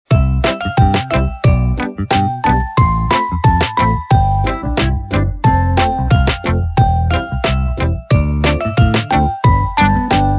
ui game bgm; LINE Pokopang; Android

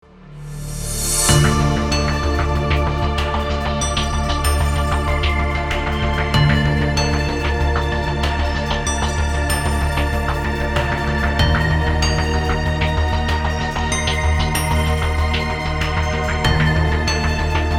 Event Suspense Light

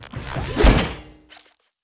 Nomal Atk

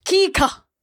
Door Close

